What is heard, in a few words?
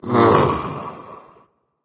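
nonverbal surprised; smite; voicepack; merlin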